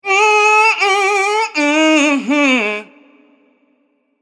Gospel Pitch

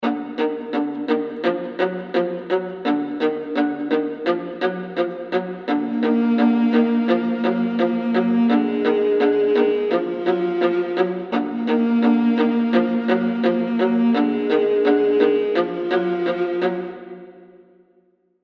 Viola; Spies

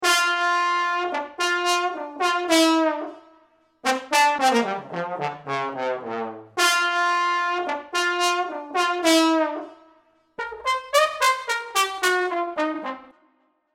The Trombone

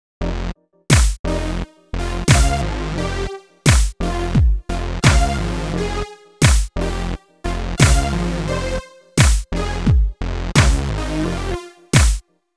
melody leaps

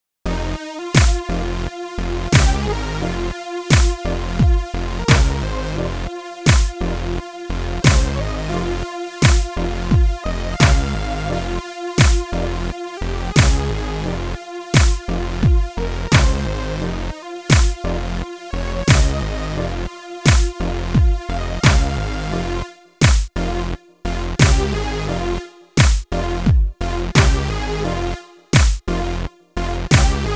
HipHop Melody Rising

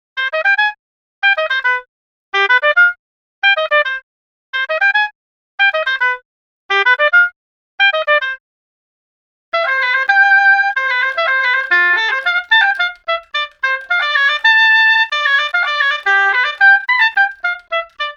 The Oboe

